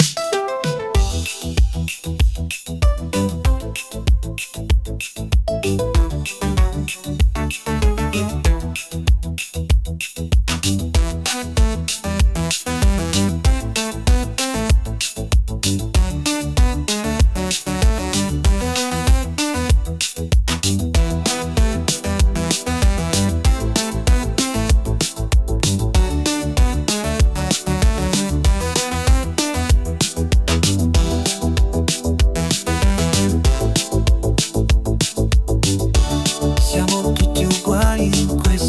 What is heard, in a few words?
Funkeria